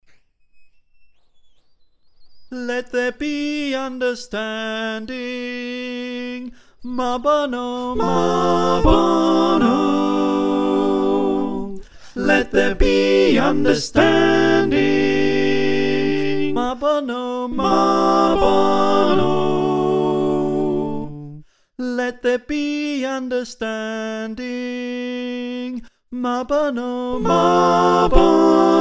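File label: soprano alto tenor bass, choir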